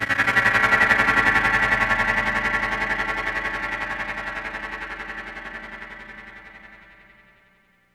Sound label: CS80 chord hit tremolo, A7